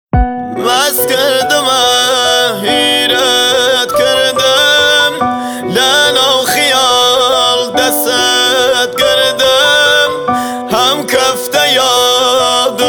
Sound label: kordi ba gitar; kordi ghmgin